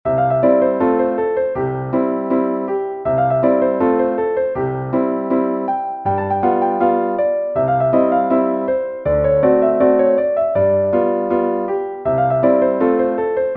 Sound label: Polka, piano; Touch Not